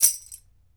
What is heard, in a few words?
BEL RATTL, African Perc; E-MU Producer Series Vol. 7 – Old World Instruments